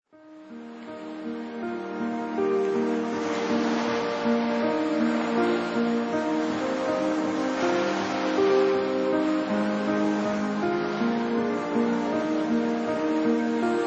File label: relaxing piano music with nature